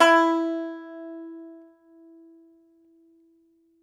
Tenor Banjo

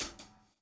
DOWN, DOWN KIT 78

